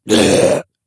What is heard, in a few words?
slash; cstrike